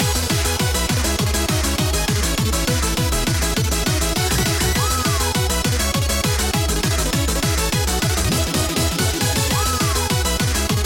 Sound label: loop